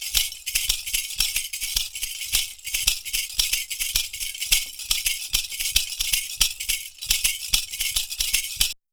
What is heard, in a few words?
PERCU; Univers Sons; Raricussions